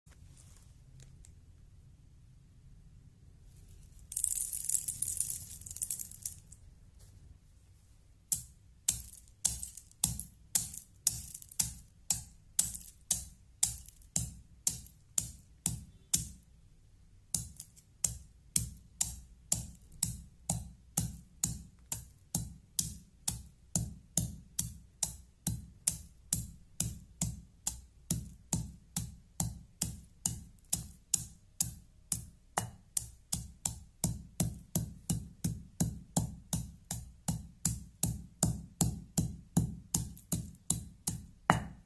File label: masher, گوشت کوب